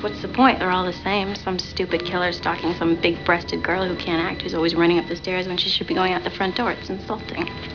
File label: scream